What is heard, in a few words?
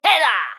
Pecheneg Red Attack